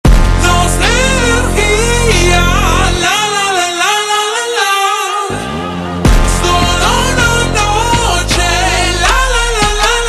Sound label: Funk ultra Slowed